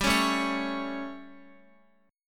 G Suspended 2nd Flat 5th G, Guitar, 4F, 4A, 5C, 5G, 5C, 6E; strummed; x,x,7,6,9,9